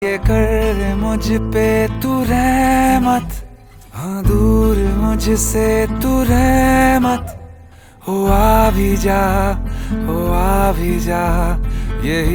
Ringtone

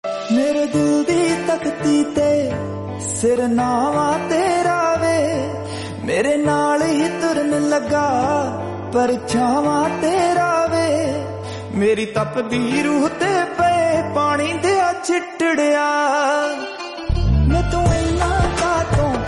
Ringtone

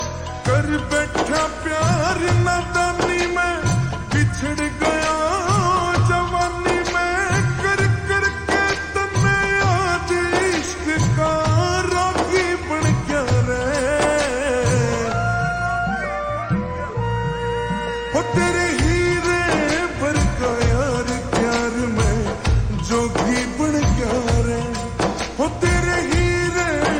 Slowed Reverb Ringtone

